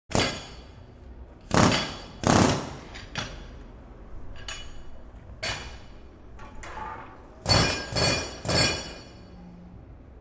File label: jackhammer